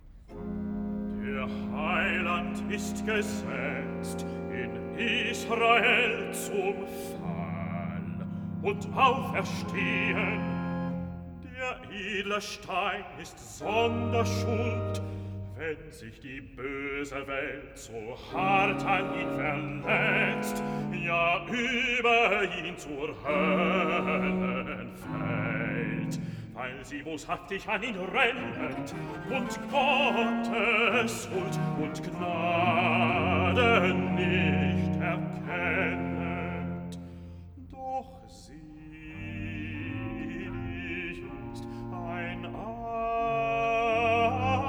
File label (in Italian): Recitativo (Bass); Bach; Cantatas; Tritt auf die Glaubensbahn; Der Heiland ist gesetzt